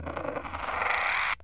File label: screech